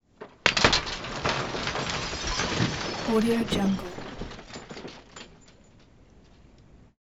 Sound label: wood glass crack; prosoundfx